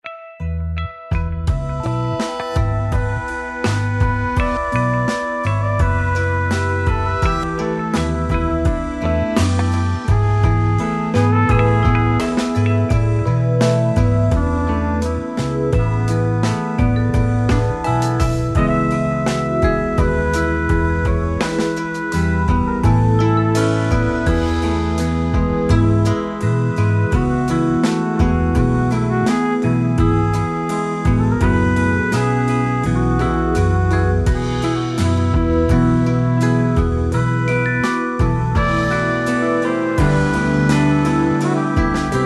JAZZ BLUE OCEANIC

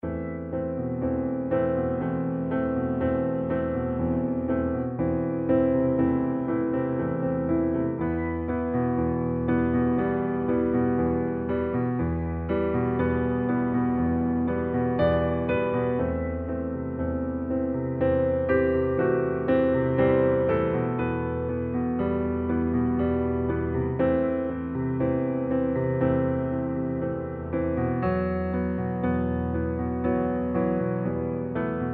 ROLLING STRINGS

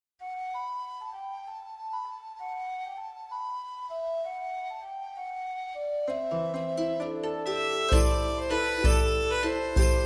MEDIEVAL STORY

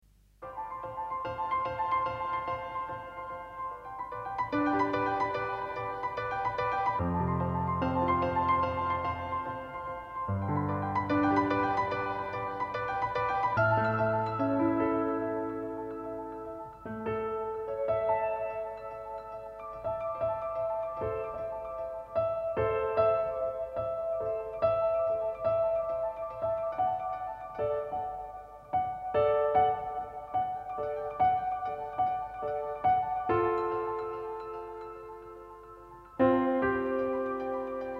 PIANO

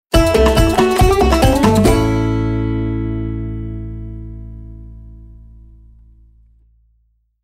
JINGLE BANJO

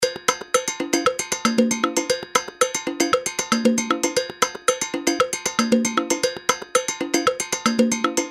SALSA BEAT

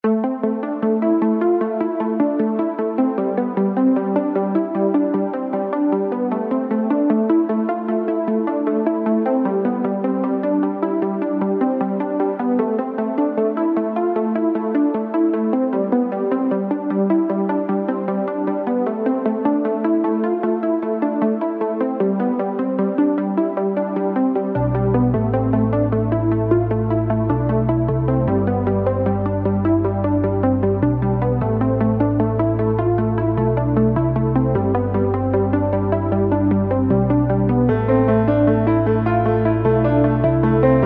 ORGUENA

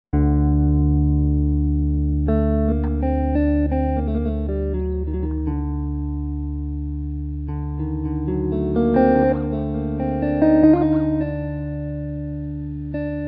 JAZZ STRAT

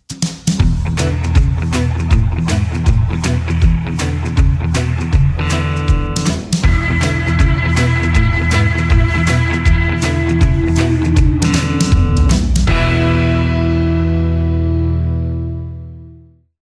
JINGLE ROCK

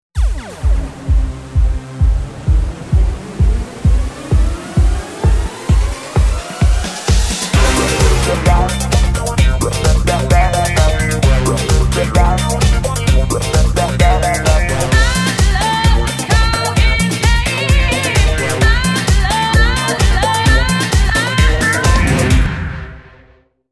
GENERIC KARAOKE

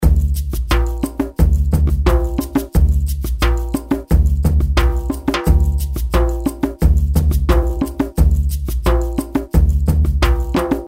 BIG GROOVE

